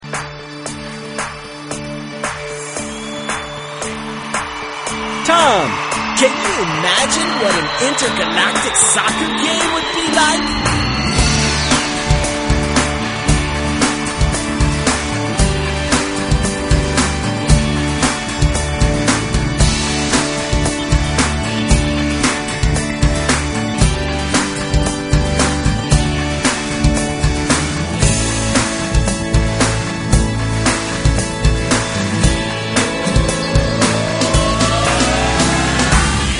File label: tom